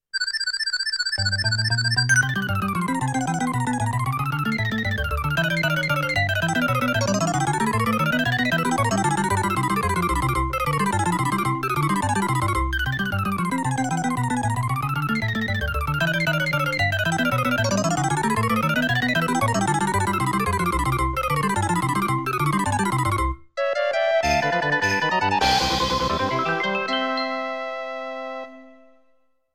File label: Round Clear; Taito 1500 Box; Scitron 5th Anniversary; Halley Rise